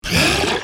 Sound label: kramp growl; halloween